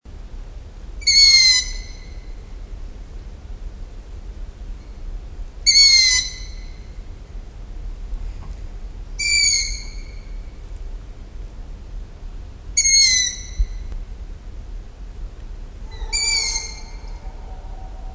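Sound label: nature sounds, long-eared owl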